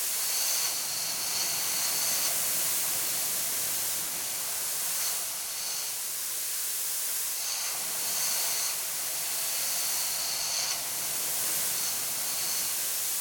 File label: Weather-Disruptor Smoke; viewfinder